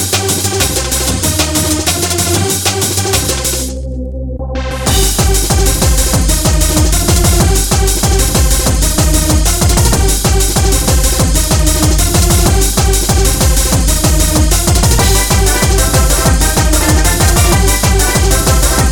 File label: groove machine